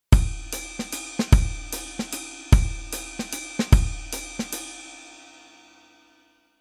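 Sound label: Rhythm Velocity 42 Snare Drum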